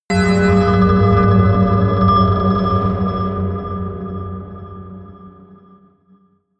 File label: melodic anvil scrape high